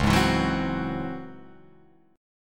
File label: C sharp Minor Major 11th C sharp Guitar Open F; C#mM11 strummed; JGuitar